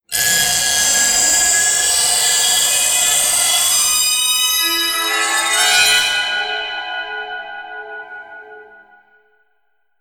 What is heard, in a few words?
String Disquieting Wailing